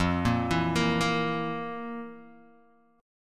F Major 7th Suspended 4th, Guitar, Open D

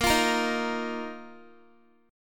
A sharp Suspended 4th A sharp down Guitar; 4E,4A,5D,5G,5C,6F; strummed

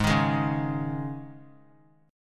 G sharp Suspended 4th G sharp; G#sus4 strummed; JGuitar

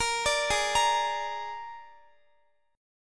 Ab Suspended 2nd Flat 5th Ab Guitar; arpeggiated; Absus2b5; x,x,20,19,20,17

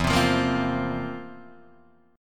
C 11th E

